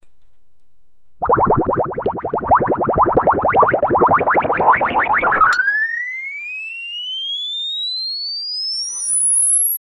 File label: a comedic boing sound that rises in pitch